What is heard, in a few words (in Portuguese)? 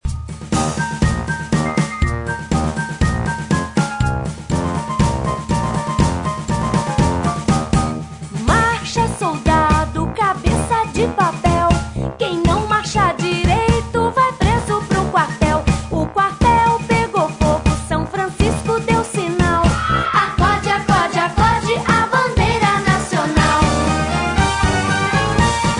Marcha Soldado, Cantiga De Roda Infantil